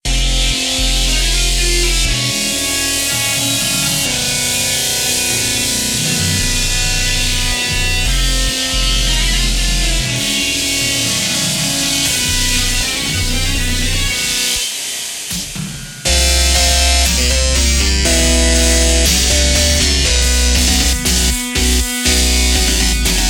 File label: metalcore 8bit